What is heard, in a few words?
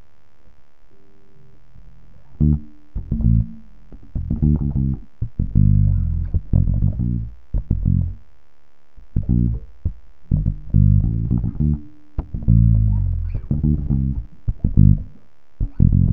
Funky Groove